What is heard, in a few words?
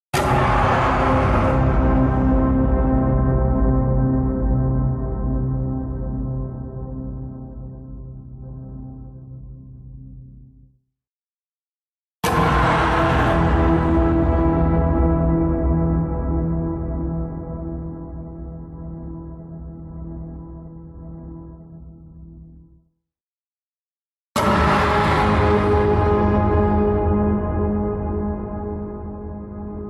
CINEMATIC HIT Hard Buzz Synth; smartsound